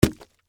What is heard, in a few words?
Impact Watermelon, Texture, Drop, Fruit; Drops; Objects